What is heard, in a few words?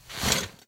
ladder, footsteps; garrysmod; player